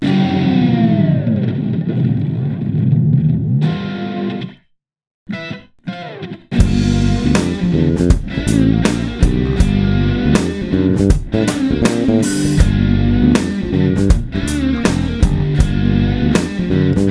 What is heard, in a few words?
Funky Drums Evil Guitars